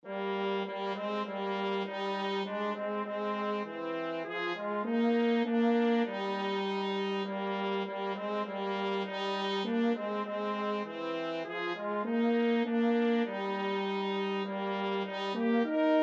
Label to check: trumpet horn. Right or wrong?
Right